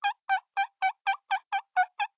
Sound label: Wiping Glasses; blue archive